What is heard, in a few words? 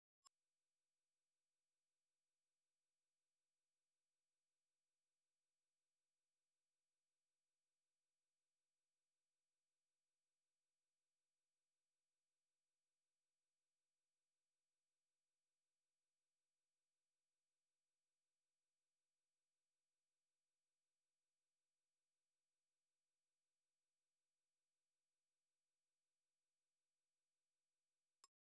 19999hz Sine Wave 0db L; Denon Audio Technical CD